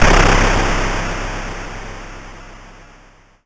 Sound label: YM Noise; NOISES; ChipShop Deluxe; ChipShop Hits